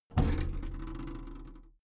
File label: big plastic case lid lift medium